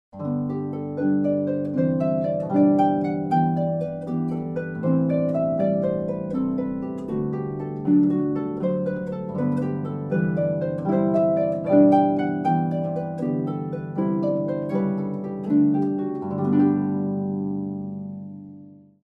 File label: harp; Kari Gardner; Jesu Joy of Mans Desiring by Bach